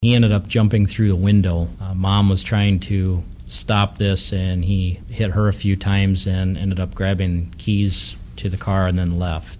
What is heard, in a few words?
beat